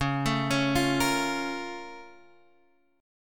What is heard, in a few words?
A sharp Minor Major 7th